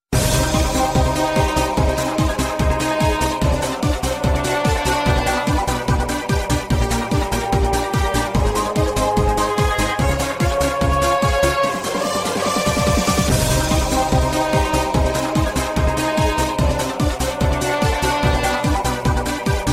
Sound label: CLOSING, game effect; WWTBAM